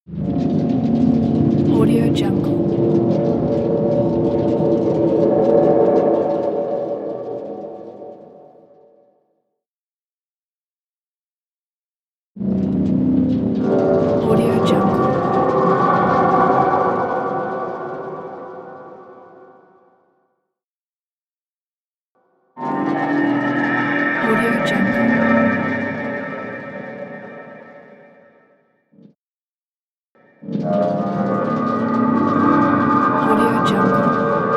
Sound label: spooky horror transition